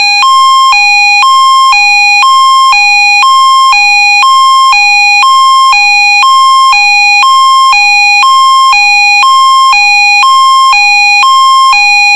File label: special warning